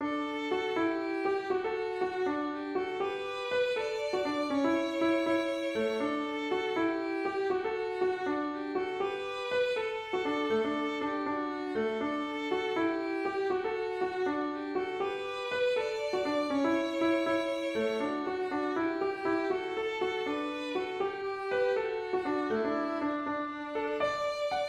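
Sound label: piano violin; Mrs Buchanans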